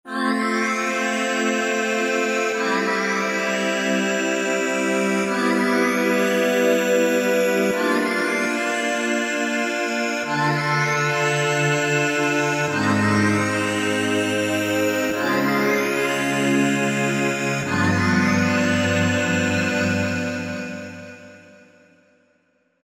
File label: Machine Choir, Roland V-Synth; Factory Patches